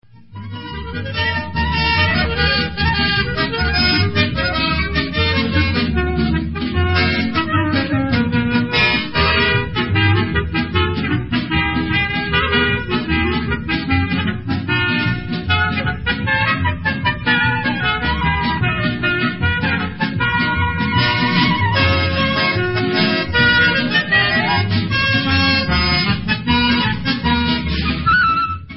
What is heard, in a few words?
Debka Instrumental